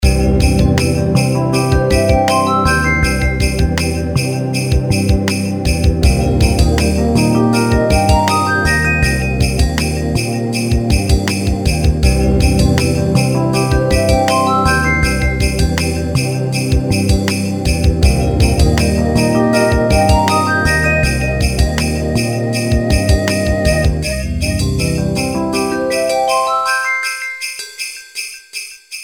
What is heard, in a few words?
Ring Tone Winter Chill; Nokia; Christmas